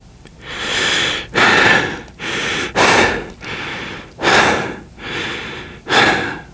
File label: exertion minor, survivor voice, biker